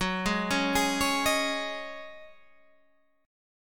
C sharp Suspended 2nd Suspended 4th F sharp, chord